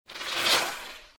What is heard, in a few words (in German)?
fahrrad bremsspur timestretch